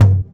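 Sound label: dundun; ILIO - Ethno Techno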